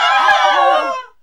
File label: NOISE, CLASSIC VOX; Best Service; Hallelujah